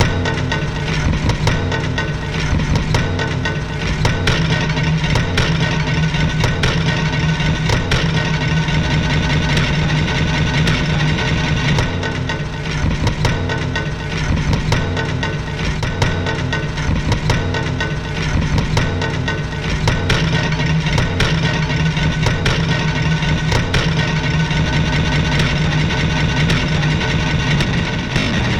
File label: pizz